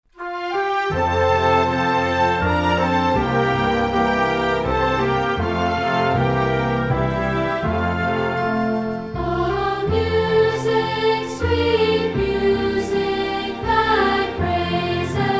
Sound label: o music sweet music voice